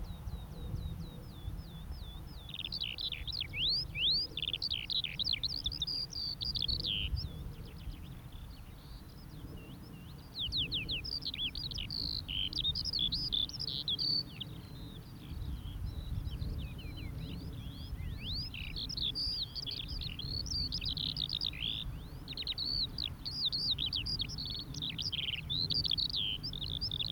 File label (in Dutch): veldleeuwerik, zangpost op de grond; skowronek; joost van bruggen; knalzuiver, keihard